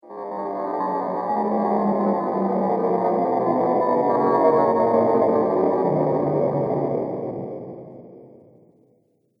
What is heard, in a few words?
Spaceship Ufo Lands, spacecraft sound effects